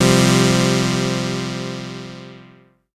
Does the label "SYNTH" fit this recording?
Yes